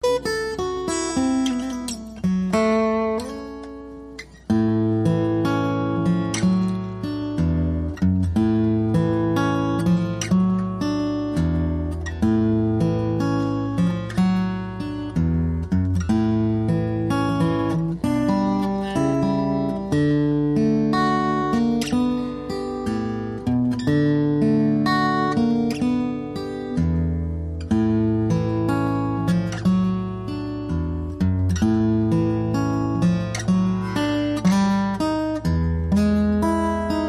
Steel String Ballad

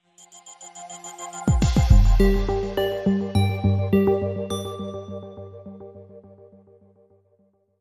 Lounge Sting

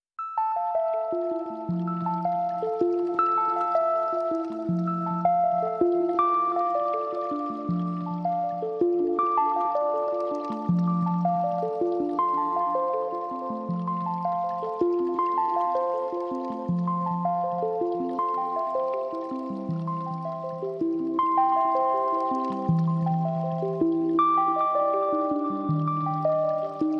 Arp

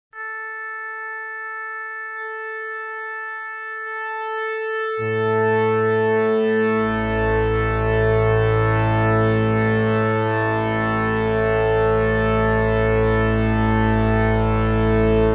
Orchestral Tuneup

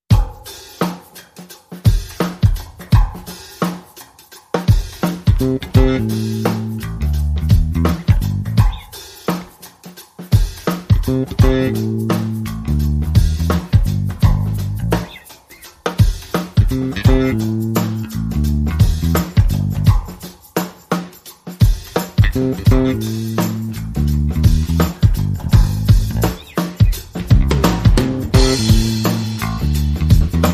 Drums and Bass Only

